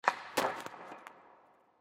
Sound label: Hockey Slap Shot, Hockey Slap Shot Puck; Hockey Slap Shot Puck Into Goal; Sports